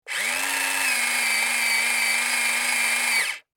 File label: RC truck electric motor whirring; rc toys